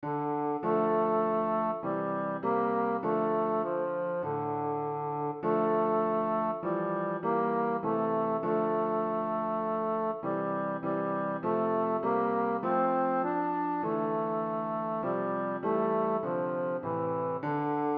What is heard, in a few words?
Tenor, choir; chalice choir practice files